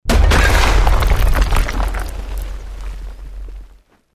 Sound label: explosion medium stone rock